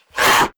CROOK WAR DAMAGE

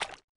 Water Walk

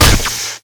gun Shell, Damage

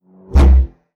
Beam Axe, Weapon

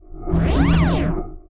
Armed Ghost idle

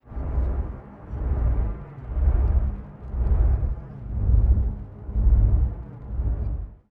Stone Fire, ambience

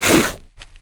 attack

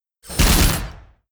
WAR ATTACK, Mob; HOBOTURNPIKE

